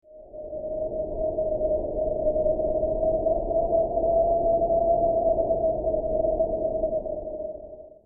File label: Gentle wind